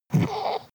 goat grunt

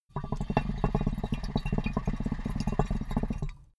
water pipe puff bubble long

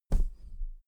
pillow hit mattress

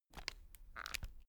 spectacles case open soft

